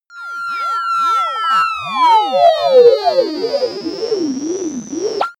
alien energy

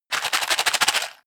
matches shake

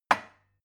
glass put down cupboard

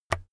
keyboard external key release

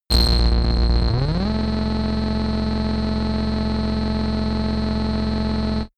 machine start

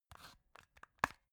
plastic remote battery compartment open

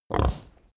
pig grunt close

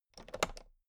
toolbox latch close regular

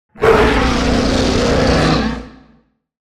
creature growl

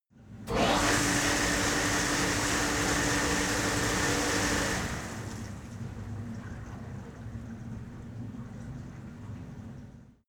cleaning machine start running stop

